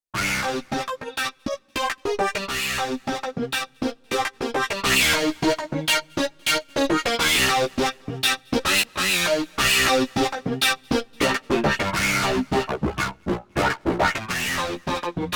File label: Wah Guitars, Korg Radias-R